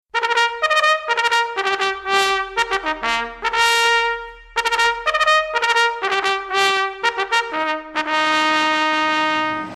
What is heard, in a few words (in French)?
Sonnerie - Fermez Le Ban